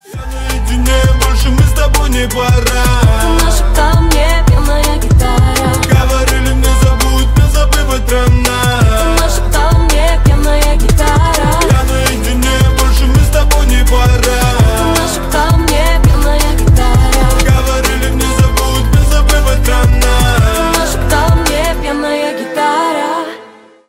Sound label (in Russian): пьяная гитара; Рингтон